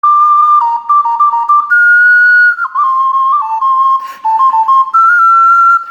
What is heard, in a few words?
ocarina